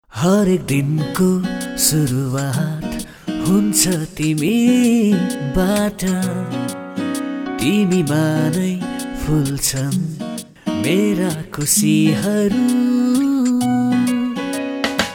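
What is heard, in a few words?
JINGLE scratch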